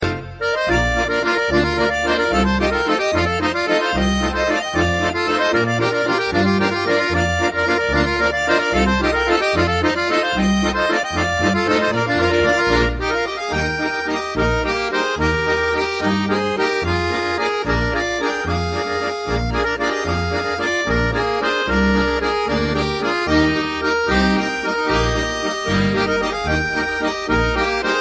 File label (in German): landler